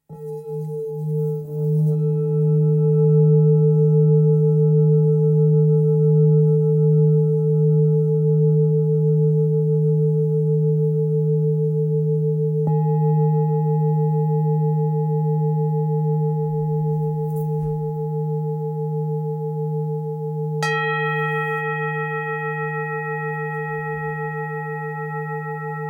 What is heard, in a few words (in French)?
Bol super lune belier la